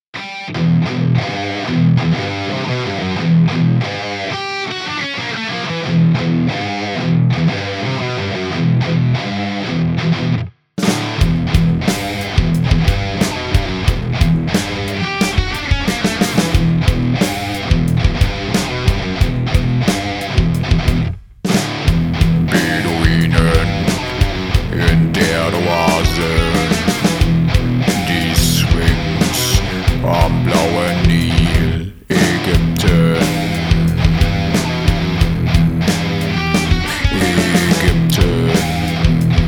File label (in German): gate, metal, gitarren